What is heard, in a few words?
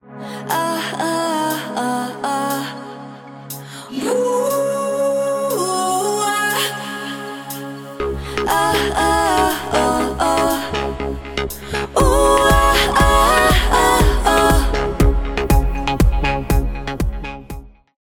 Music Summer